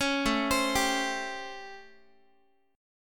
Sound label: A 7th Sharp 9th, Guitar; A7#9; arpeggiated